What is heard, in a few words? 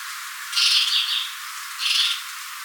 Icterus cucullatus